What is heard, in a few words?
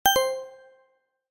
Bright positive click